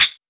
Perc

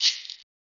Percs

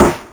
Rimshot, LinnDrum

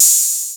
Open Hat

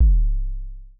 808s

